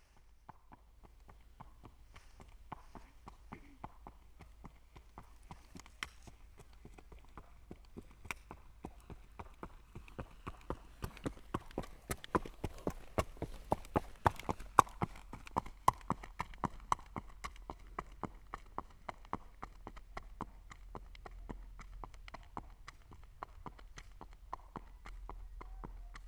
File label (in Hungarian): aszfalton elhalado lovas, lo; marantz; parasztudvar